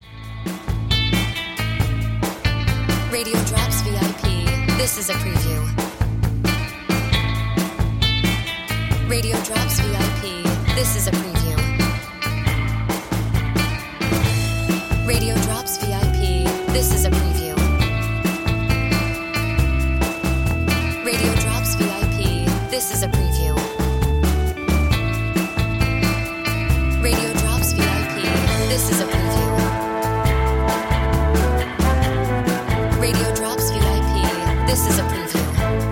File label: Music Bed